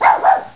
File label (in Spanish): perro; primartis; sonidos